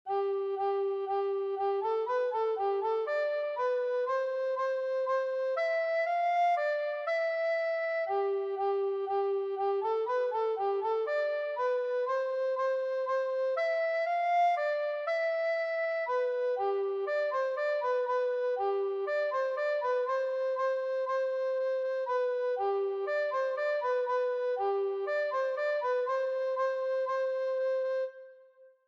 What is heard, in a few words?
Alto Recorder; Clog Bransle